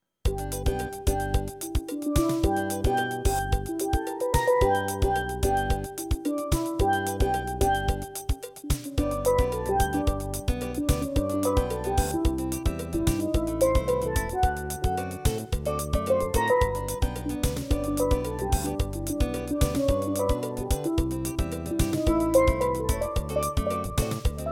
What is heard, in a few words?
Jingle Bells